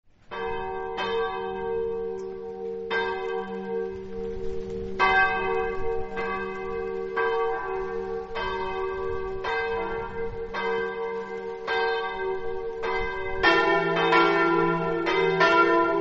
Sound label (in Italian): campane, Jalmicco